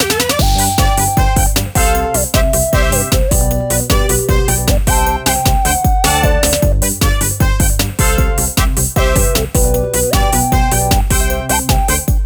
BGM Win; Clubhouse Games: 51 Worldwide Classics

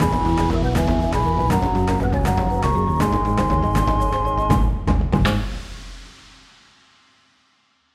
Win Level; Zuma's Revenge

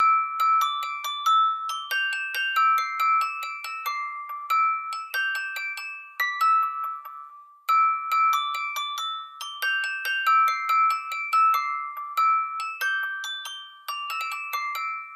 music box; the sims medieval

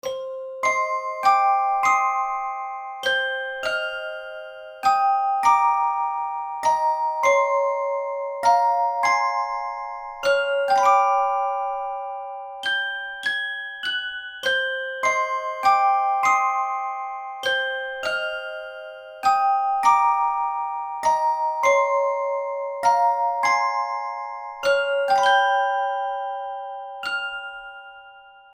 Clockwork Overture; Heartbound

